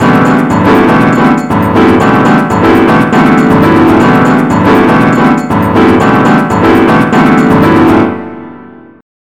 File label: SAMBA; BUSKER L